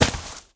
land snow

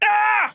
Scream

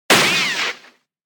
cowboy shot

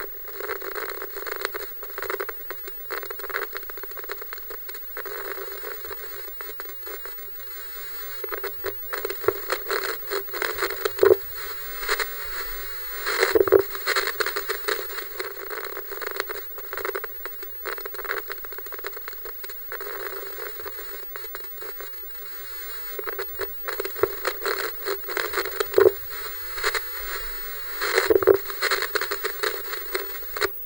Tv Turn

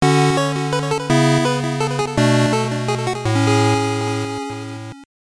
Jingle; gbc; Hello Kitty no Sweet Adventure Daniel-kun ni Aitai

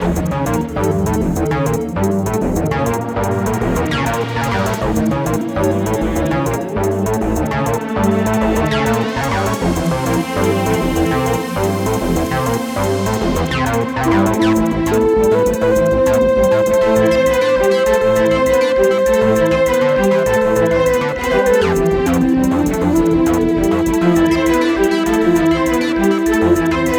normal loop 100bpm; chicory a colorful tale; ps4

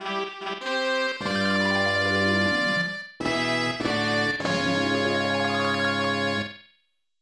News Jingle; Super Momotarou Dentetsu DX; SNES

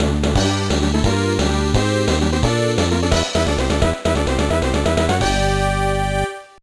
Jingle; Super Dodge Ball Advance; GBA

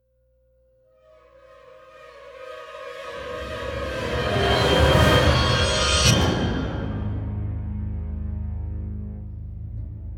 Stinger Drama; Dishonored

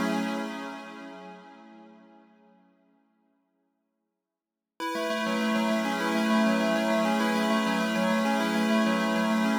Synth Layer

